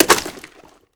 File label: pkm box out, weapons